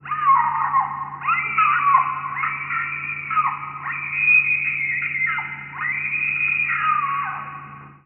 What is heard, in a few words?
scream